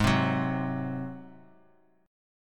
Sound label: G sharp 7th G sharp, Bass Standard; G#7; strummed; x,11,10,11; JGuitar